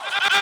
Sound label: vox